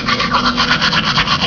BRUSH

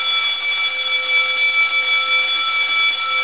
School bell

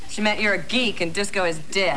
DISCO

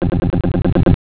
beat; SoundEditor

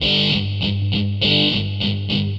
GUITAR, ROCK POP; MAGIX

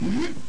humph

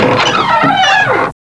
DOOR OPEN

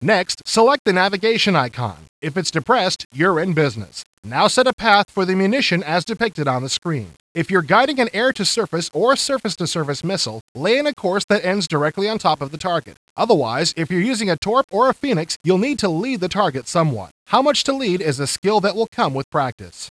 HARP

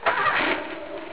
CAR START; EFFECTS

